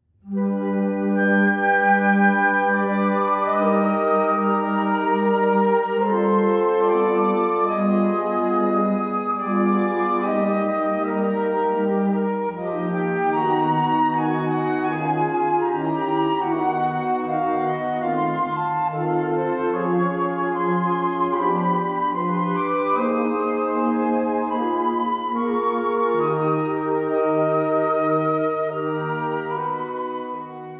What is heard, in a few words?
Suite for flute ensemble Overture